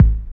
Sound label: DR. LOOP, DRUMS; X-Static Goldmine 3